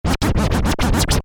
Scratch, Record, DJ; Musical; Stuart Duffield

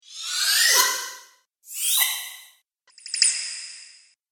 Quick Spells, Magic Sound Effects; 3 Quick Spells - 03; Role Playing Game

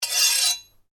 Blade Scrape Medium, Sword

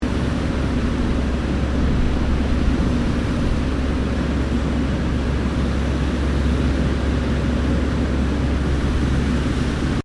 FACTORY BOTTLE MAKING AMBIENCE; General factory Ambience; Machinery; Machines

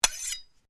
Blade Swish Low, Sword; Foley sounds for melee weapons; Swords (Sound Effects:Combat)

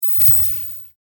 Electric Discharge, Magnetic, Science Fiction; BlastWave

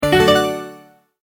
multimedia tone advance; Tones; Sound Design; McKinney Sound

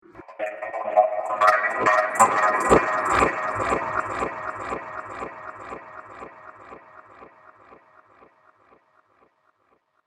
Demon Spawn, monster sound effect; fantasy

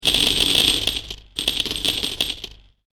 Payout Falling Coins, slot machine; Andrew Fleming

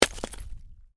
Ice: medium chunk of ice lands on hard surface

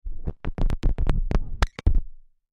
Record Needle Touch; DJ; Musical; Blastwave

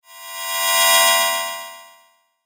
Sci Fi Scanner Beam Short; Tech

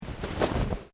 Clothing Kimono Sleeve Move

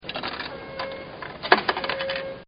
Deposit Coin, Money; Objects

